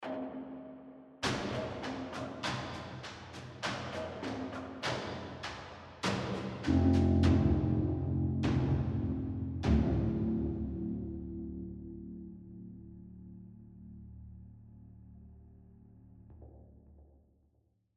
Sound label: Tension Block